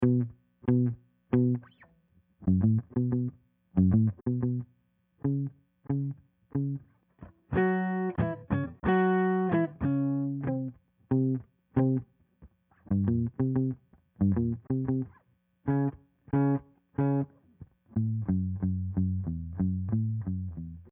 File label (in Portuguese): GUITARRA